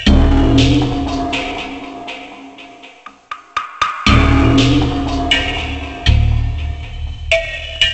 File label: Frontend Music; ultimate mortal kombat nds 2007